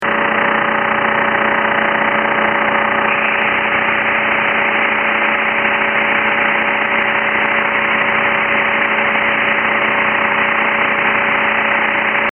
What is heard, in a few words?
washing machine